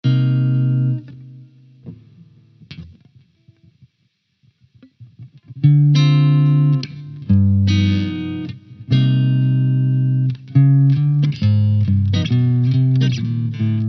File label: Sean LP Jazz, Pickups